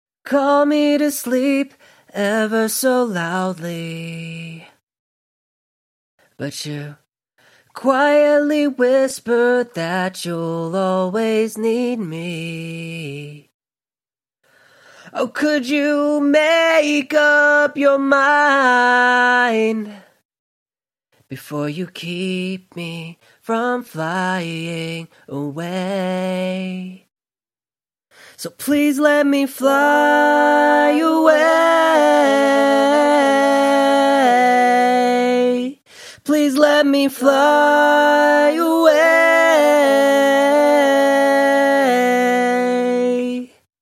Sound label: pop 127 Bm